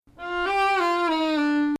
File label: Bayati, Maqam Bayati; Bayati 1